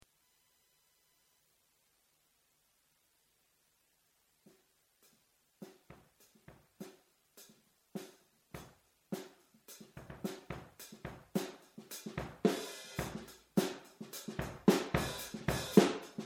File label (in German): Trommeln